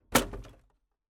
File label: impact junk thrown into plastic bin